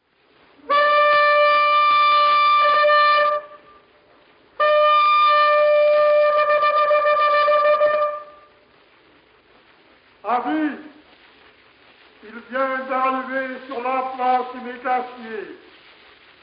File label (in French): appel du trompette municipal; barcelonnette